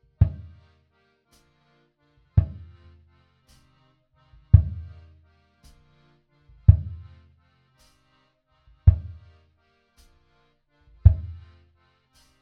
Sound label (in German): Bassdrum Kein EQ dann Boost 5 bei 100 Hz dann Atten 5